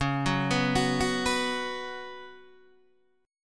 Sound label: C sharp 7th Suspended 4th C sharp, Guitar Open D